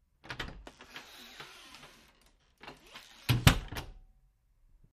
Interior Bathroom In Bus Or Trailer; Latch Clicks And Door Creaks With Handle Jiggle, X2

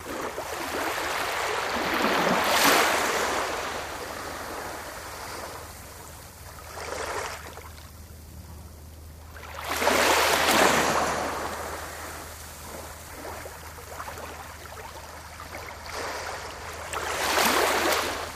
Ocean Water Lap Smoot

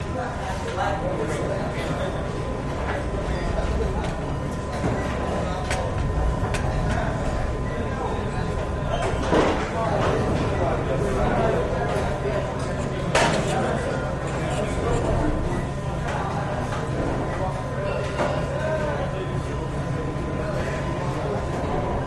Army Dining Room Wall, eating & drinking

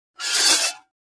Metal Scrape On Metal

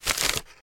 Paper Grab Quick; paper mvmt

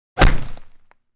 Bullet Impact Sweetener 5, Into Flesh Or Dirt.

